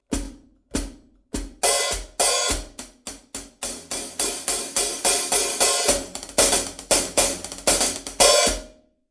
med hihat 14, cymbal; sabian; b8pro

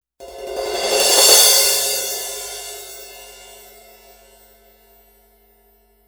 thin crash 16, cymbal; sabian; b8pro

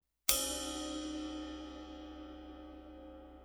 med ride, cymbal; sabian

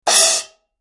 splash 12, cymbal; sabian; hhx

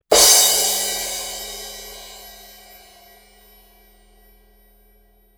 medium crash 16, cymbal; sabian

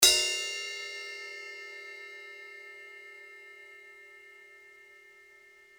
med ride 20 bell, cymbal; sabian; b8pro

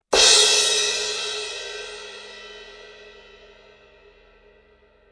med thin crash 18 hit, cymbal; sabian

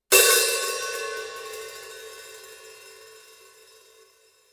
regular hh, cymbal; sabian

